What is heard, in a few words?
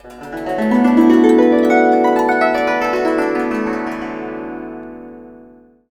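guzheng; Spectrasonics; Heart of Asia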